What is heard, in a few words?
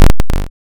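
waveform FM square sine envelope silence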